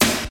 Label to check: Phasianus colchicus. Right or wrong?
Wrong